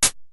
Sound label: DF-500 HiHat, Drumfire